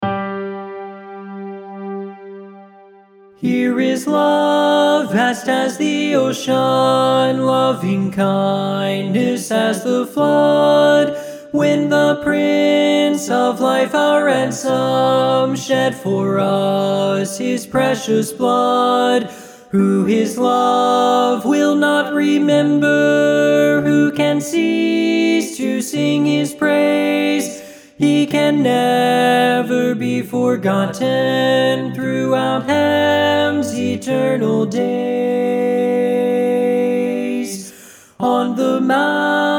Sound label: TENOR